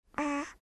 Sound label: Ahhh